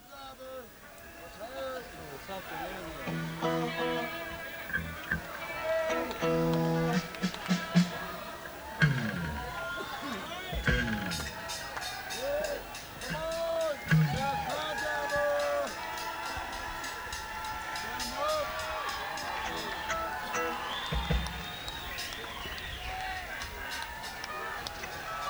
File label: Crowd Tuning